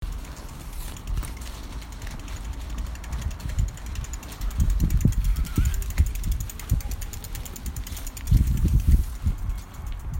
Bike Chain

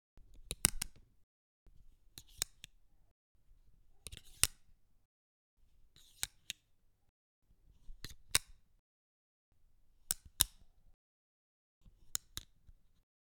Eyeglasses Frame Open Close

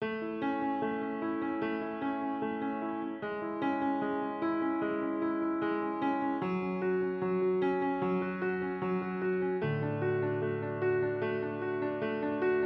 75 Bpm

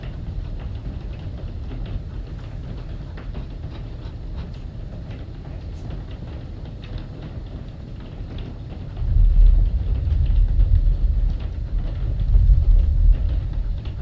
Tornado Closet Thunderstorm

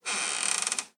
Chest Openning Crack

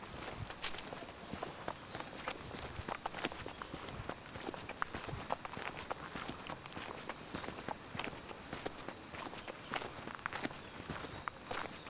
Saltwells Nature Reserve Walking In Woods

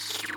Laser Beam Phaser

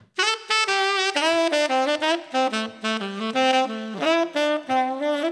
Sweety 8beat Dmaj 90

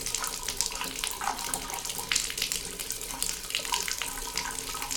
Bathtub Trickle

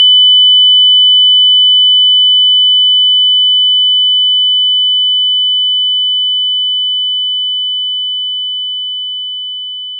After Explosion Ears Ringing

